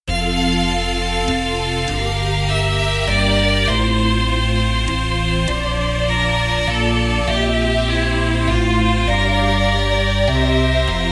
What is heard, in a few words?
Concerto For Two Violins D Minor; Bach; BWV 1043